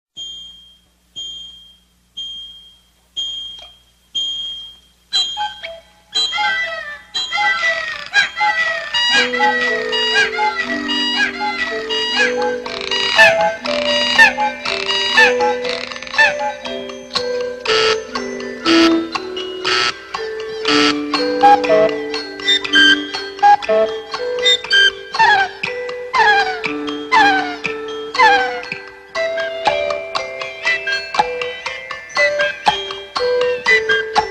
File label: Clock Sequence; Pinocchio OST